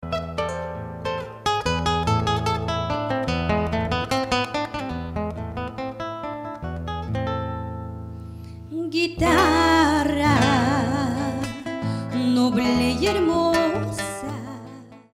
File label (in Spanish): Guitarra